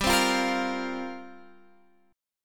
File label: F Suspended 2nd Suspended 4th G